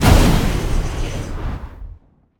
Activate; smite; horus

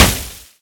fire hit; agni; smite; merlin

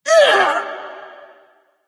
grunt hurt; smite; thanatos

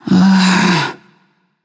grumble; smite; athena

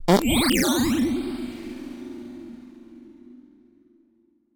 Defeat Fart; smite; god cupid skin13

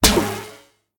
Bounce; smite; raijin

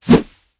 swing; Smite; god Kuzenbo v2

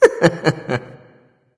hehheh; smite; nezha

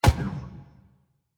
defeat throw; smite; raijin

